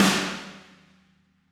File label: Snare Hard; Drums; apulSoft apTrigga2; Steven Slate Drums